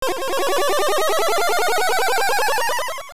Begin Quest; Link waking up on Labrynna or Holodrum; Zelda Oracle

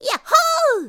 Sage Cheer; Gulley; The Sages cheering Link on

